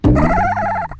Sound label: bonus; MexicanaChillitime